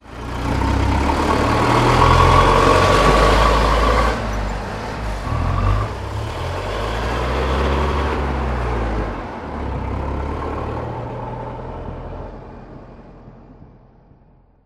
truck passby engine; scripted sequences

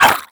grunt, headcrab, creatures; headcrab classic; vox

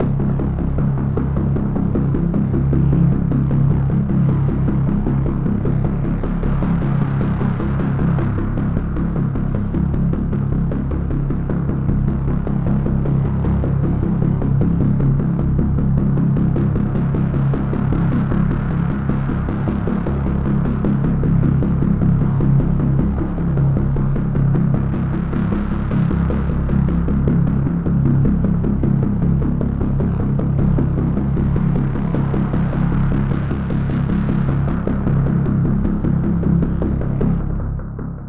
garage start elevator, music

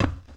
glass, footsteps

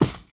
carpet, footsteps

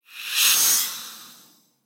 ambient steam rush; ambient air; Half Life Alyx

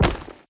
defuser impact; physics; weapons

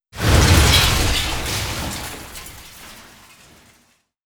grenade explo debris; weapons; Half Life Alyx

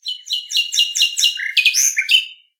nightingale; animal

